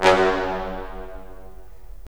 BRS, Roland LA Composer

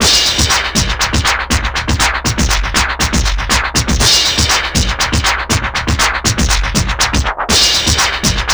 TRIPHOP; INIS; Funky Xtreams

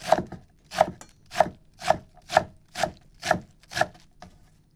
KITCHEN KNIFE; AKAI S6000 CD-ROM - Volume 6; Tools

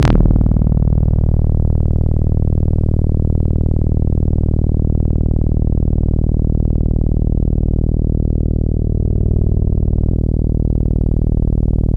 AnalogBass d#1, Yamaha YX81Z

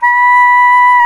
SAX SOP MP B, Soprano Sh; Roland LCDP07 Super Sax

